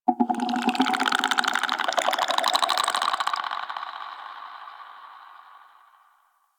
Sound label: Infested Devourer Vocal; Warframe